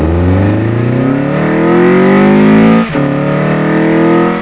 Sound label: intake